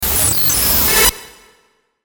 Glitch Interference Burst Reverb, Glitch Digital Descend; Production Element Imaging Element Accent Transition; Blastwave